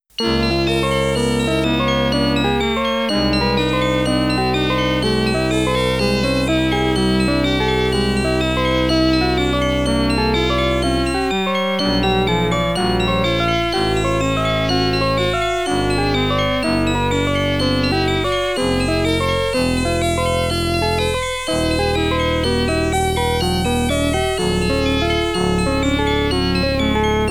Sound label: White Electronic Version